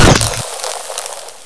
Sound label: hornet hit; XDM